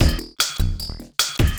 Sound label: Beat, Drum N Bass